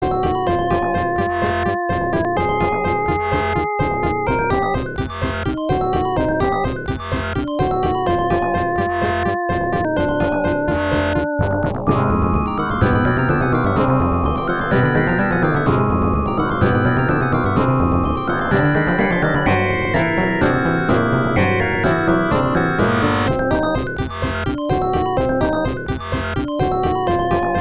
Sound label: GAME RINGTONE, INSTRUMENTAL; CASTLE OF ILLUSION; MICKEY MOUSE